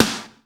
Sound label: DANCE S; 90 sSampleCDs; Crypton - DrumBase5000